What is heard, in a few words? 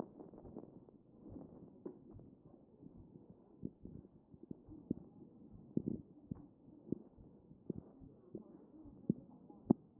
CHEST pos4 D MODE